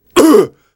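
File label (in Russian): реакция мужчины на удар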